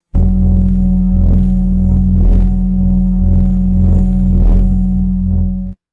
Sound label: rhythmic synthesized electrical pulse; electricity